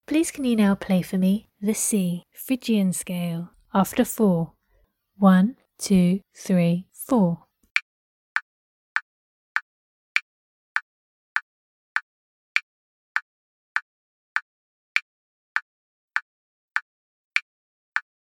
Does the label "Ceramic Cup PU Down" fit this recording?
No